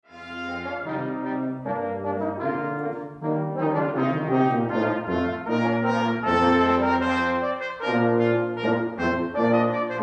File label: rondeau, brass